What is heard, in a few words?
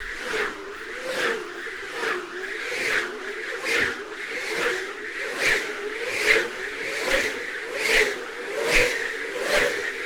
Whoosh Whisk Spun Around; Sartorial Sound; SWOOSH THERE IT IS